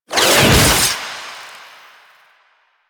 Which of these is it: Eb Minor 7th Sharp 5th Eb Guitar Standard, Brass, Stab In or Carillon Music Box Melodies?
Stab In